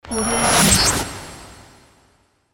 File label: futuristic whoosh transition